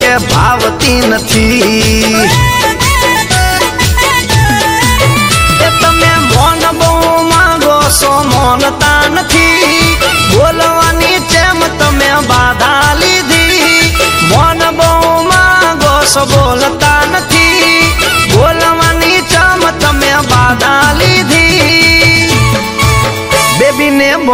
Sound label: gujrati superhit ringtone